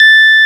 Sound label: PUNCHREEDS; Wizoo - Powered DX